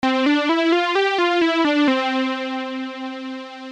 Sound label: mono